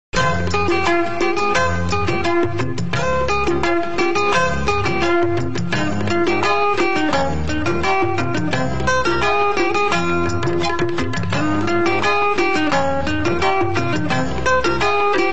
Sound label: bgm theme